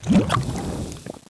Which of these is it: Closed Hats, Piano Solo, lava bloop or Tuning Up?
lava bloop